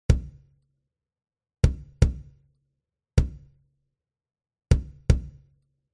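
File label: drum bass without eq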